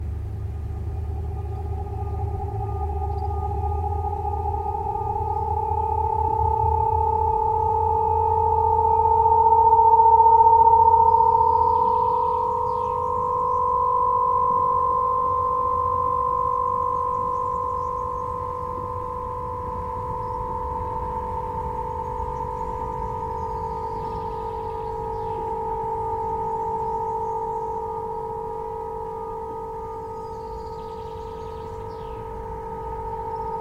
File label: Atuning In Wind String drum Horns; Stienitzsee